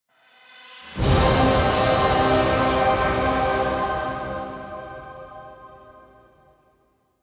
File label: Music Jingle Item Unique; Lords of the Fallen; Android; PS4